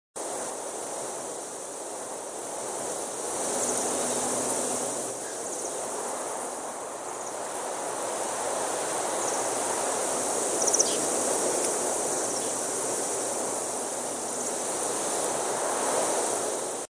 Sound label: Medium wind